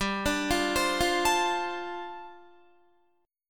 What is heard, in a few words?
G 7th Suspended 2nd Suspended 4th G, Guitar; G7sus2sus4 arpeggiated